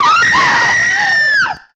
scream

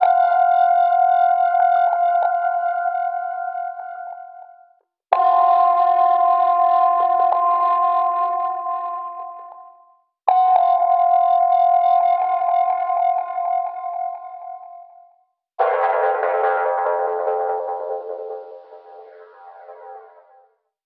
keys alarm codes; Loops; Electronic

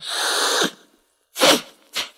snorting

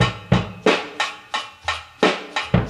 scratch, drum loops boombap

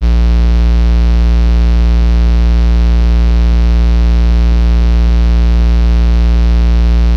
buzzing, Synths; Notes

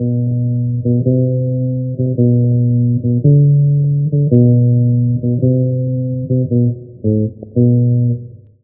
bass

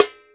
punch, Misc Percussion, Ticks

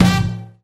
circus

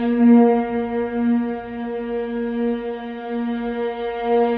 sustained, String

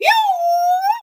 "yoowee"; ooh ahh; ho hoo woo

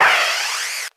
air escape; Zaps and Beeps

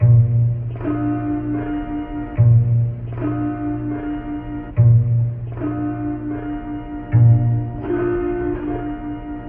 strings got a drum, Loops; Phonky

